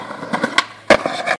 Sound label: Notification